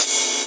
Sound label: Ride, Cymbals; Vengeance Samples